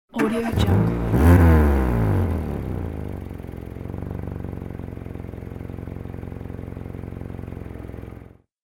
rattling car ignition; urban sounds

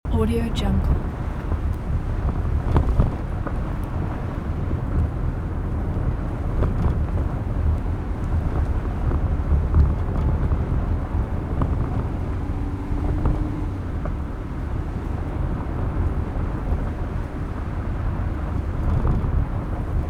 car driving on field road outside rear loop; urban sounds

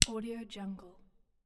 drumsticks hit

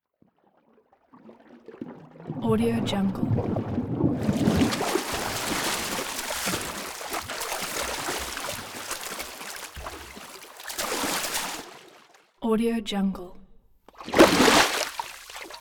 swimming water emerge swim splash; human sounds; sports

